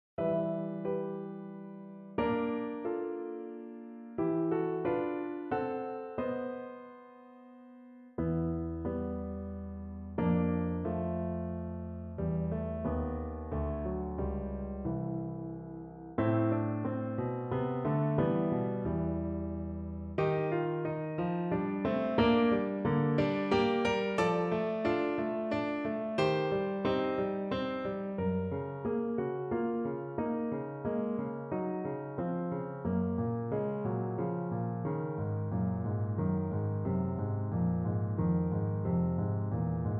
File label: piano excerpt